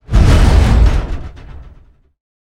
metal container movement, huge metal storage container rattle